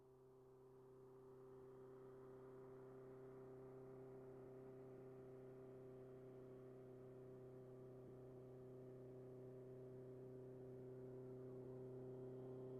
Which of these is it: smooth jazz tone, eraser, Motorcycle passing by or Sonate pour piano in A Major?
Motorcycle passing by